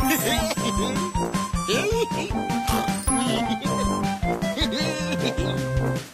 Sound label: star loop